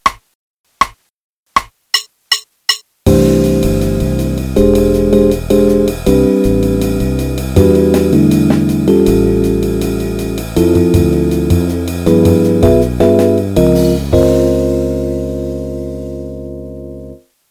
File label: C Major Scale, 2 octaves qn=160; Play-a-long; Jazz Piano